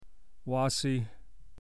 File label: Cow